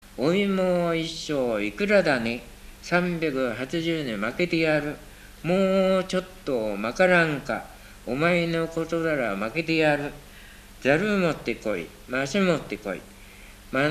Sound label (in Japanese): warabeuta